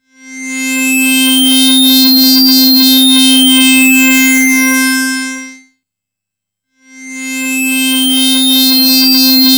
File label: C5, Synth Line; 90 s Sample CDs; NiceBeats; SynthLine VOL-21